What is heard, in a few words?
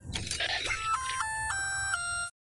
ring